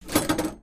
publictelephone receiver

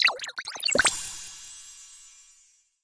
logo animation

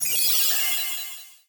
cute pose

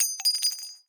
sniperrifle shell

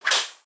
whip

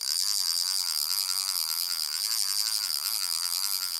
reel wind

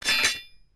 katana storage

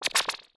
slime

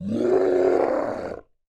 zombie voice

